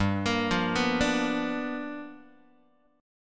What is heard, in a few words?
G 7th Sharp 9th G chord, Guitar; G7#9 arpeggiated; JGuitar